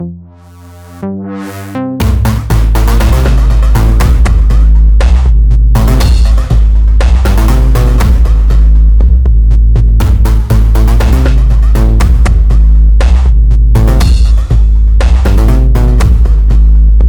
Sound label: Bootsound; tested with robots; wii u